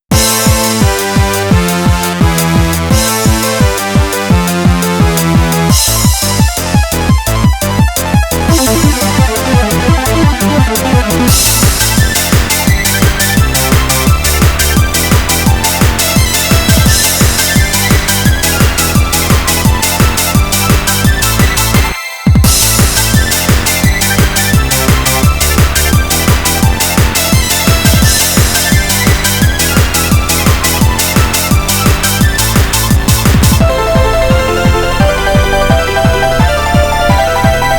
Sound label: instrumental